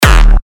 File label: Kick D#; Temp; revealed magma kicks